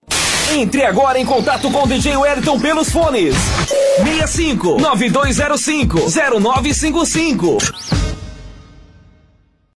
Funk de Elite

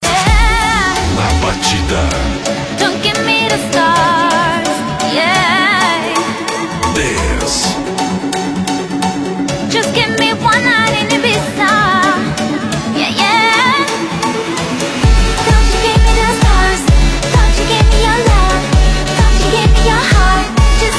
Batida Dance

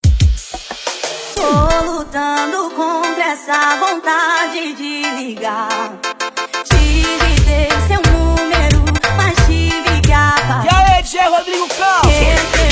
Forro e Piseiro

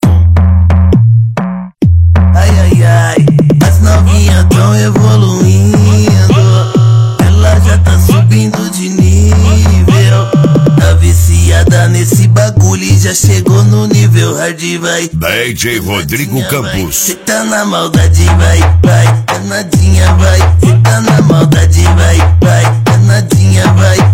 Bass Automotivo

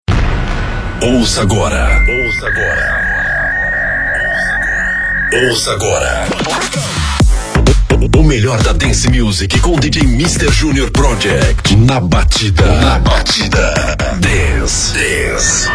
Batida Dance

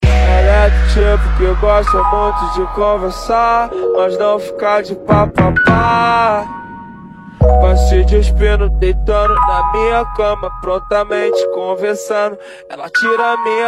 Paredao Treme Treme Mega Funk

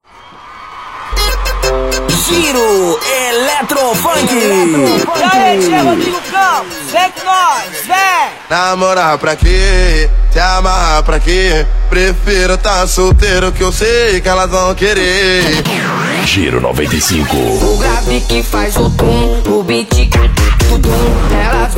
Eletro Funk

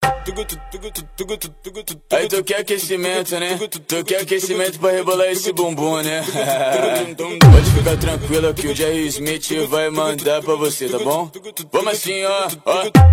Bass Automotivo

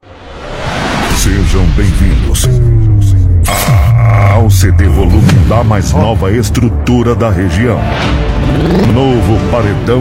Paredao Ao Vivo

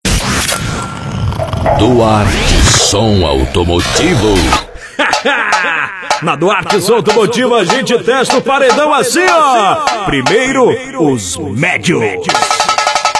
Som Automotivo

